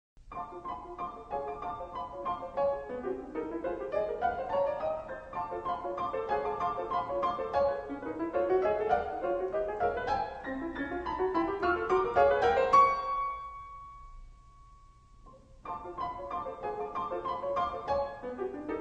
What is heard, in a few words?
piano; Modest Mussorgsky Pictures at an Exhibition Ballet of the Unhatched Chicks piano version